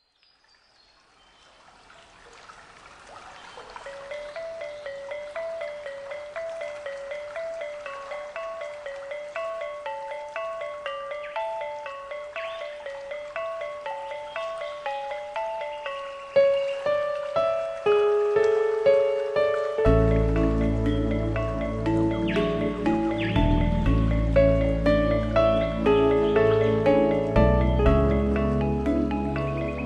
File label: piano gamelan; balispa